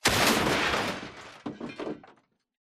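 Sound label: Stalker Nuke Destruct Explosion Metal Debris; Titanfall 2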